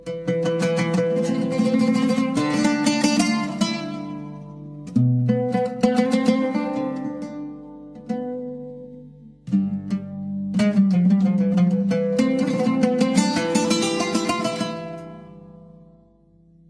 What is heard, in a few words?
oud